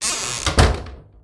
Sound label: close, door, wooden; open close